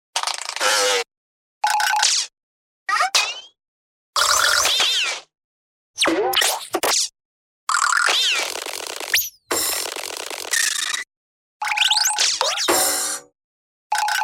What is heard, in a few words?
sound effects